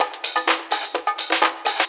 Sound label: Dance Grooves